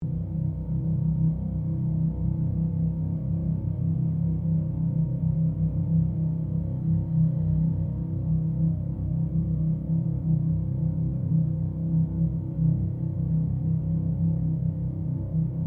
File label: Created Drone, Drone Sound Effects